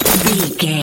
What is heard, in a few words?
ice spell impact icicle hits